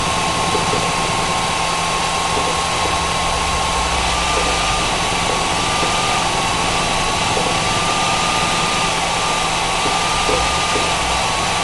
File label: fan; graphics cards; ati; x700xtpro